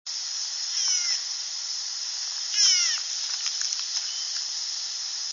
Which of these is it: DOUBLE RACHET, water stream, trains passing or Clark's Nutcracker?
Clark's Nutcracker